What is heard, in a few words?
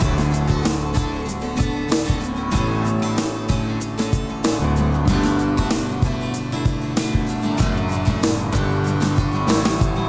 Full Mix